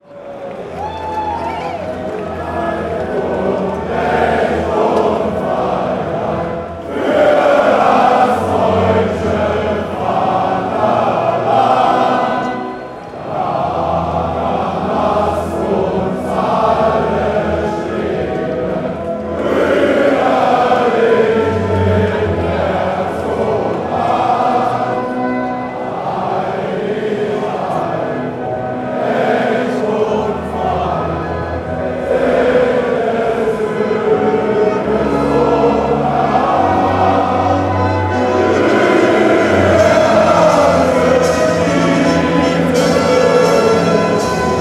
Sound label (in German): deutschlandlied deutschland nationalhymne